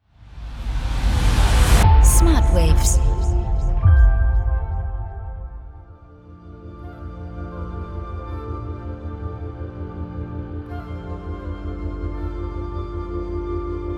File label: Calm Oasis